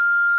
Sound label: Recorder Tone, IP Phones; Cisco; IOS; 7942G; English